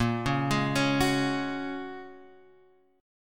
A sharp Minor 7th Sharp 5th A sharp, Guitar Half Step Down